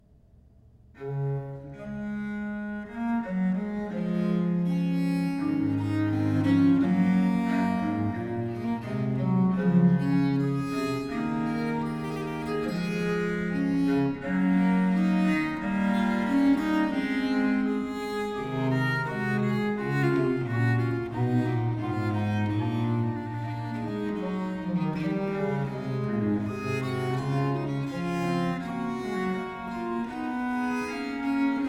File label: duo for two bass viols, in d major minor; early music